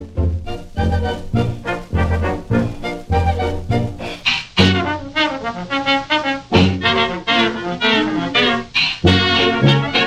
Bass Blues; 1928

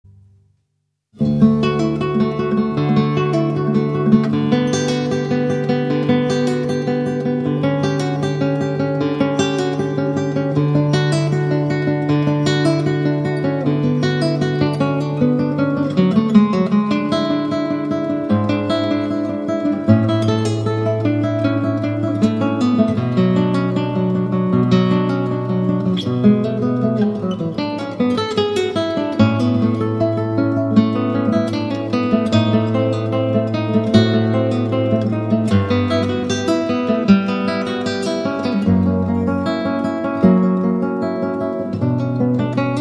Cello Suite No. 1- Prelude; Bach, Johann Sebastian; arr. Michael Lorimer for guit

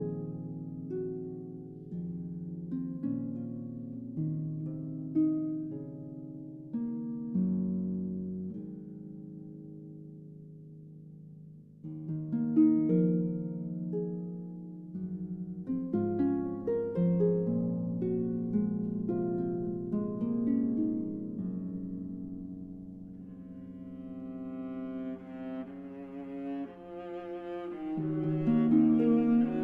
Harp Concerto Lullaby

